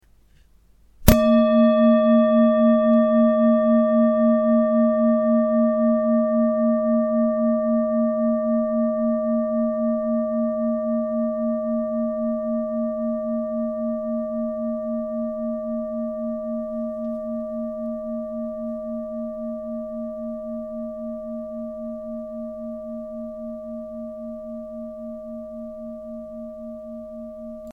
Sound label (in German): Klangschale